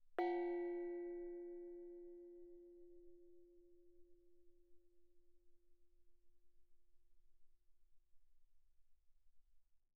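Thai gong F4 mezzo forte, Percussion, gongs & tamtams; thaigongs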